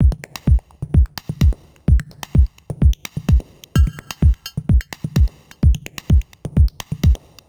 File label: drum; whisper